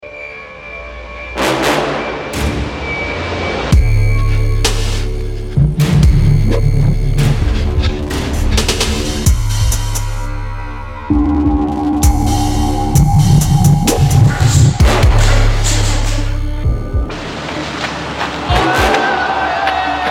trailer; hustle

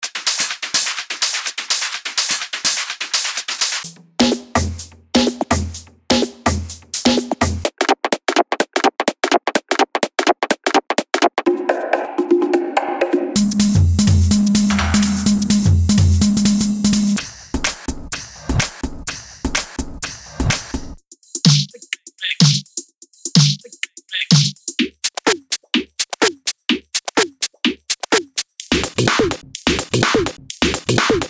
drums

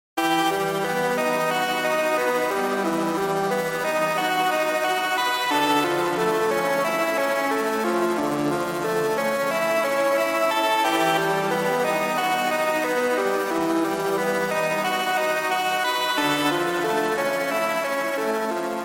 alien vibes

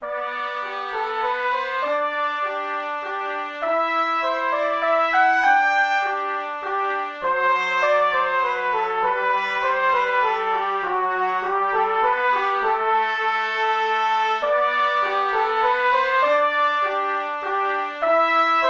cornet legato

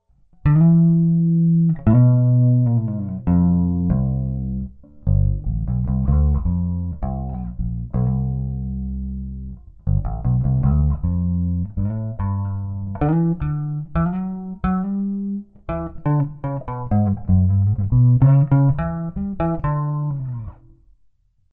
druid bass

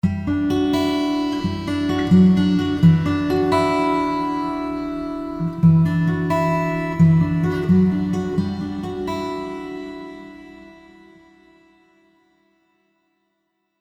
fingerstyle acoustic

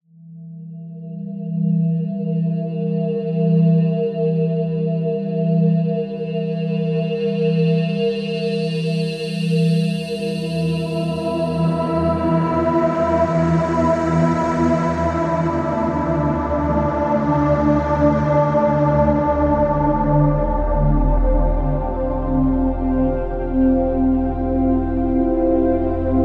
pads; serum particles

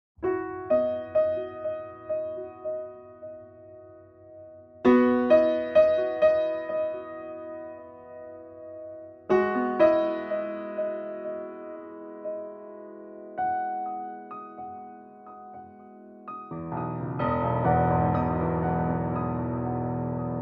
close mid far mix

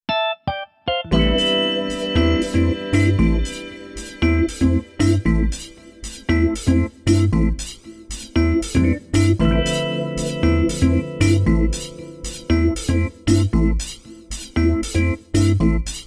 forks groove

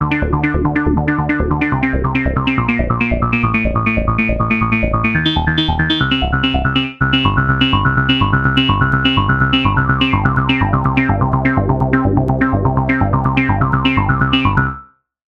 bass resonance

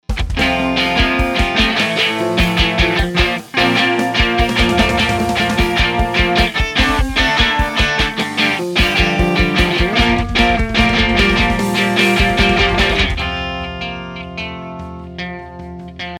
dystortion dry part

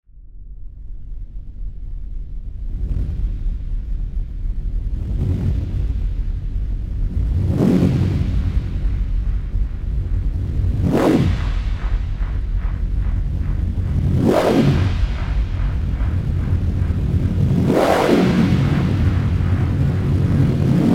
horror score

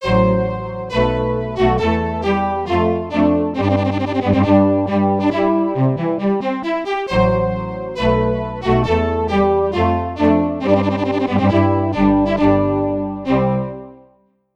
strings choir

